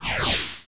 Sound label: Whoosh; Golf Digest's Best Places to Play